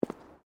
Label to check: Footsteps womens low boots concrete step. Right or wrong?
Right